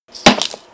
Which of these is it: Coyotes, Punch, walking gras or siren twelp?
Punch